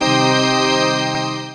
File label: SYN HUGE, dance; Sound & Vision - Gigapack II CD 1